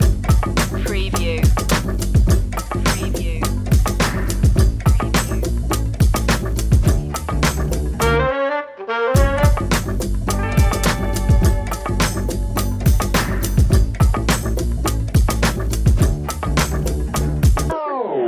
comical detective loop

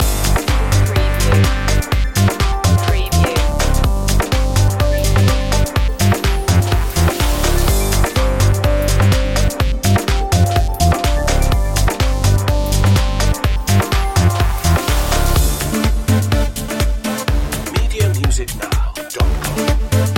bass loop

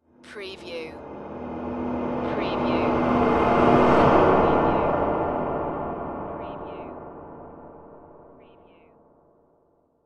Swell Airy

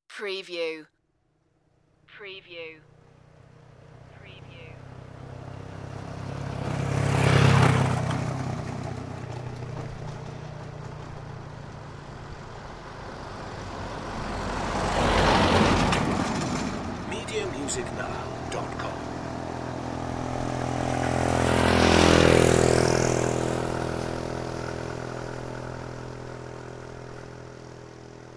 rattly cars and motorbikes

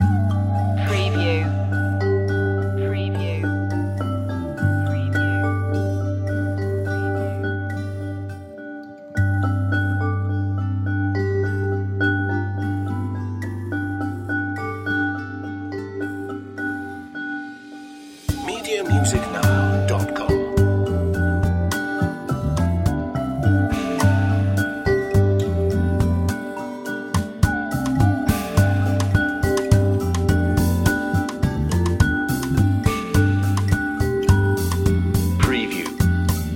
safari lite loop

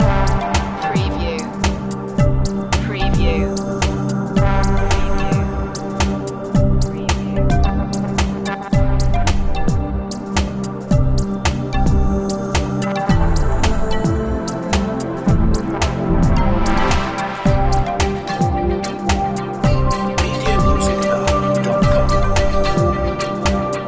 deep in chill loop

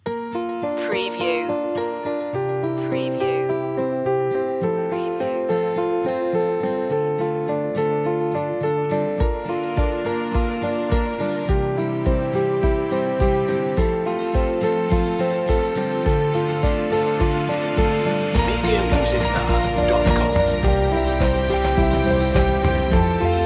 no vocals

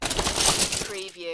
Lego, grab handful of lego from lego box

